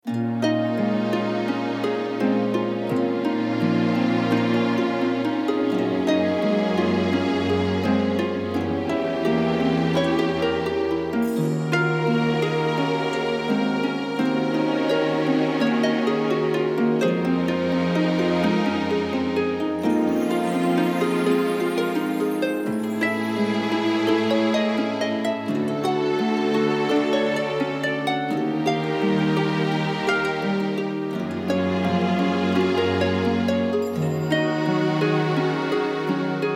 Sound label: Game Menu BPM85 Bbm